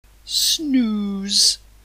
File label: snooze